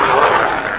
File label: GROWL; JOHNNY